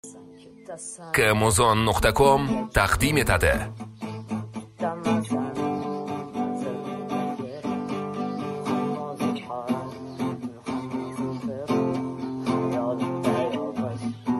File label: guitar cover